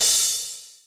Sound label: Cymbal; osu